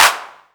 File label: clap; drums